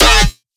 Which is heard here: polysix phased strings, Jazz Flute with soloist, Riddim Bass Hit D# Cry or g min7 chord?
Riddim Bass Hit D# Cry